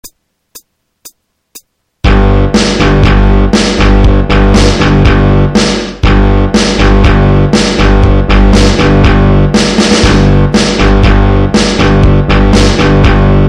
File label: falls